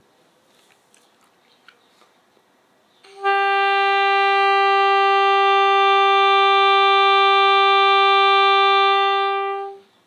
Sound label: Adjusted Legere Long Tone